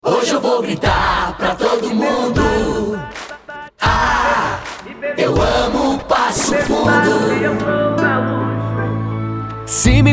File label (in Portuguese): Jingle; Eu Amo Passo Fundo